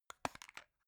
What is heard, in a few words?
plastic remote battery compartment open